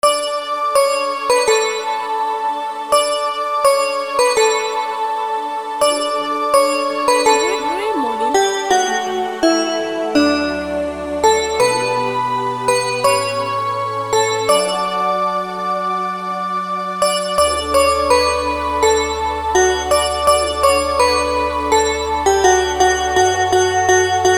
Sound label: Joy To The World piano version